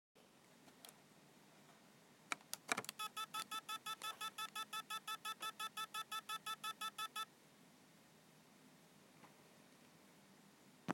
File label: hdd recovery beep